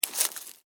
Insect Dig Dirt, Insect, Dig, Dirt, Bug, Movement; Miscellaneous Insect; Blastwave; Animals:Insects

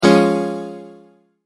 multimedia tone exclamation; Tones; Sound Design; McKinney Sound

